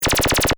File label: Laser Machine Gun, Fire, Shot, Science, Fiction; Scifi; Blast Wave